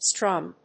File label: strum